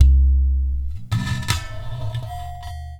Udu

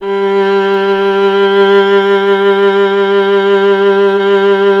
STR VLA, Solo Instruments

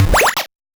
Noise A, 8-bit, Bitty Noises

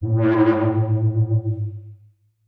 Forbidden Worble A1; radiosonics

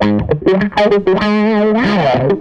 Tele Guitar Loop

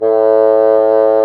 BASSOO, Bassoon; Brass&Woods

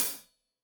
Hat Loose, Hi-Hat, Cymbals Stereo

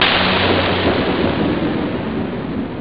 DARK thunderpitch; haunted; jazz jackrabbit 2

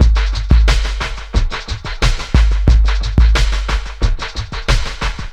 slow train, 90bpm

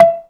ZYLIMBA; Greytsounds VOL-1

